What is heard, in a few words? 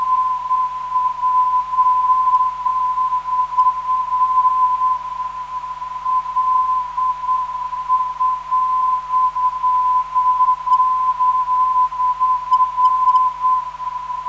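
380kHz, non-directional beacon; PHS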